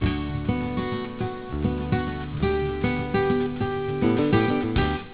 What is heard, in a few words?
guitar